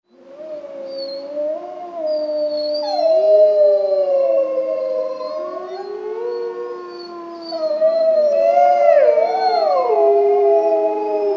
Aullidos de una manada de lobos

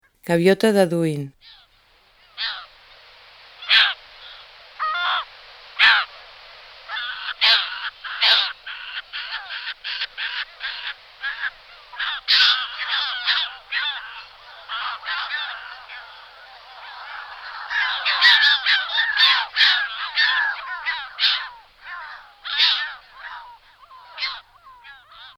Gaviota De Audouin

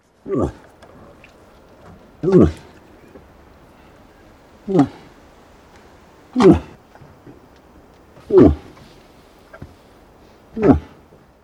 Alce

